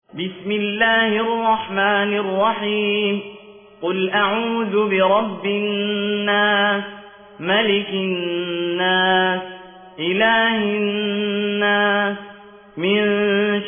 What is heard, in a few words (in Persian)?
ترتیل قالون عن نافع من طریق أبی نشیط; سوره ناس